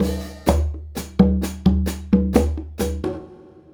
Conga and Hi Hat

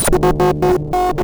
Cwejman S1 - Mod Mod

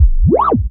Zap C2; Club Techno

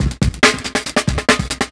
Loops Breakbeat; Vengeance - Essential Clubsounds Vol.2

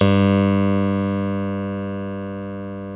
HARPSICHORD G1; Greytsounds

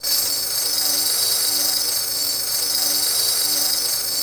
Tamorra Ending Loop, Percussion

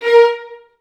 A#3, Advanced Orchestra; Best Service

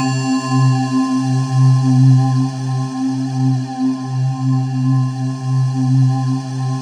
C3, Synth Leads

